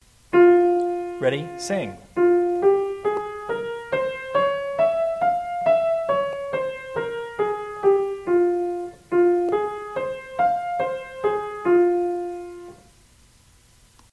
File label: F minor louder